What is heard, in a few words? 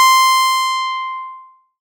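PLK C5, Roland MKS-80 synth pluck; Leads and Keys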